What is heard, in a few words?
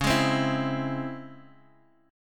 C sharp Minor Major 7th